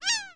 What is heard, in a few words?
Gull; ambients